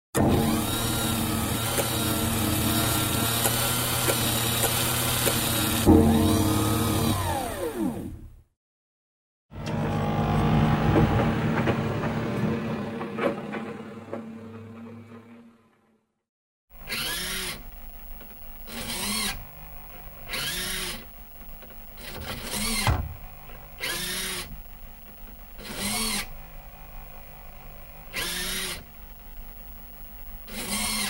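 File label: robot sound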